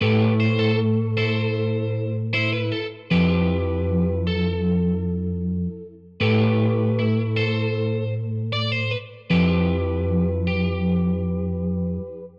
155bpm

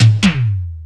TOM

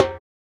African drum, kicks

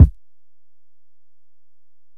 Kick

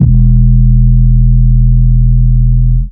808 E

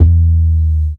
808 one shot hi E

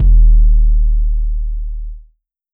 Distorted 808

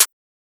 Drill Snare, 808; Sample God

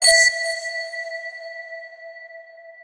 Percs

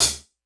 Hi Hat

